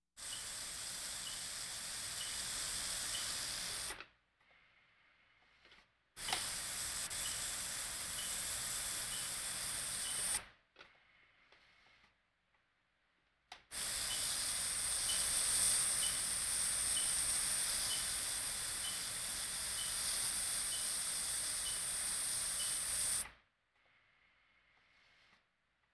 Hospital effects

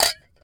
Hit Glass Jar Clink; SFX Kit; Foley - Misc

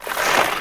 Ski Turn On Rock; Misc Sports

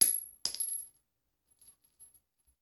Bullet Shell; Sound Ideas; Guns

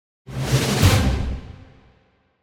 Symphonic Hit Short Final Hits - Drum Beginning; Hollywood Edge; sound designer's toolkit v2

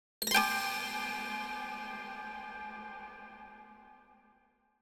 String Pluck Chord Strike With Reverb; Hollywood Edge; sound designer's toolkit v2

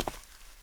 concrete; blindside

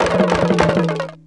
Bone Tower Percussion Rattle; SFX Kit; Foley - Percussion

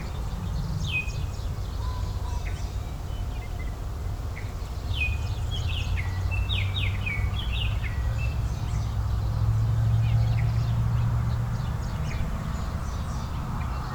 Suburban day birds traffic; boom library

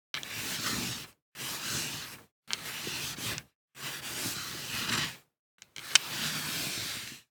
DICE on Felt, Slide, Standard, 2 Two Dice; Samples; ArticulatedSounds; DICE Sfx Party (Extended)

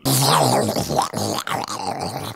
Zombie; Blind Survival

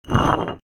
glass move; best service Blue Box; mixed sounds short

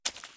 boots mud; Apotheorasis

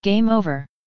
game over; DeadFright

